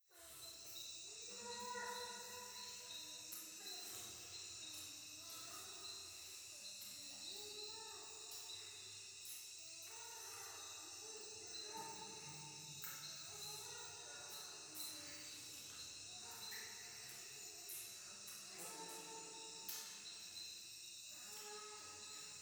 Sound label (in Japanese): 教会 イラマチオ 山奥夜